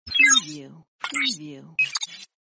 blip beep sound effect

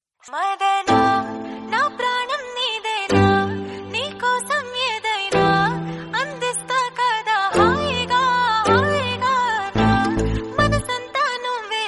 song telugu

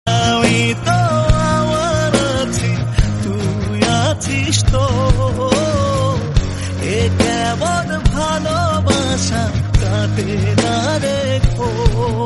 song bangla

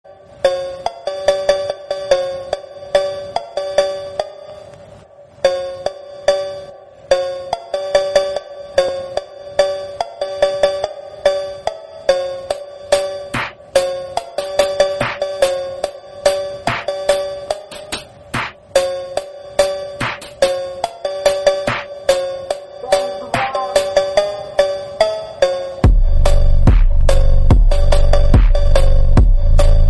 background music

